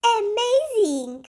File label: correct